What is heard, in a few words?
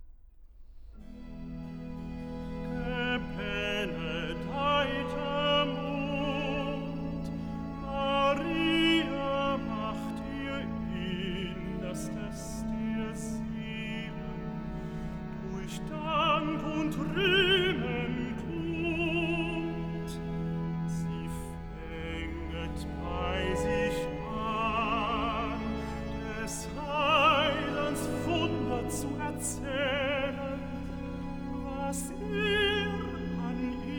Recitativo (Tenor); BWV 147; Gebenedeiter Mund!; Herz und Mund und Tat und Leben; BACH CANTATAS